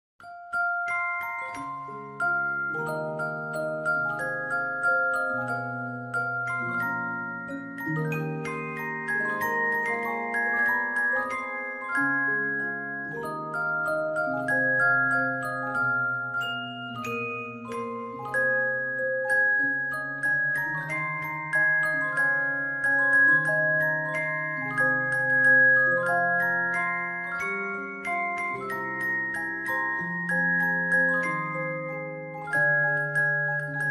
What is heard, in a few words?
music box ver.